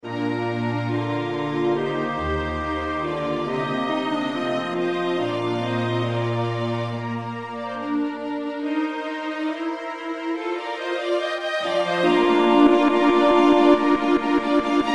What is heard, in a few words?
Organ Kyrie